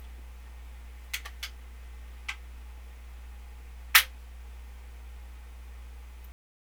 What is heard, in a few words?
Rifle Lock; forlorn